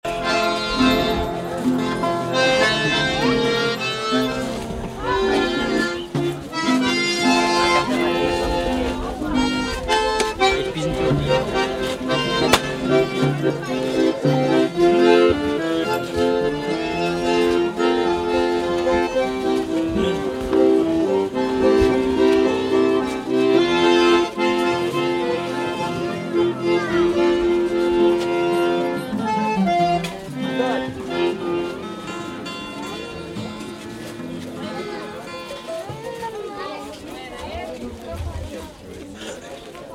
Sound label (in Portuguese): folia de reis instrumental; folia de reis do vale da pedra